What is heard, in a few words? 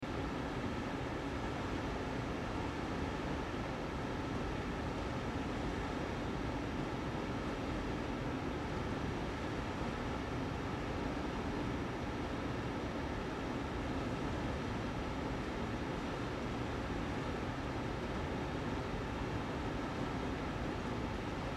Ice Skating Rink, Ice Skating Rink Room Tone Hockey Loop; SPORTS